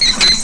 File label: chimp; Zachary's Zoo